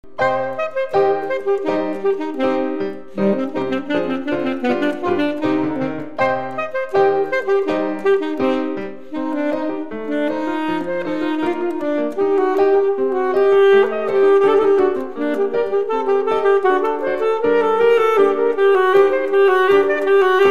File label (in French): playback piano et saxophone vitesse moyenne